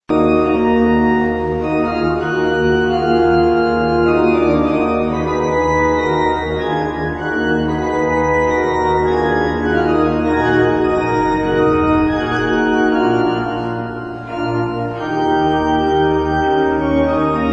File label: thomas organ